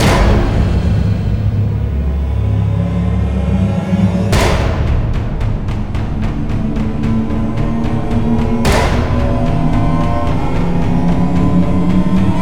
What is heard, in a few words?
Shield Sting